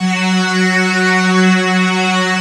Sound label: Prophet Five; E-MU Formula 4000 Series Vol. 3 – Analog Odyssey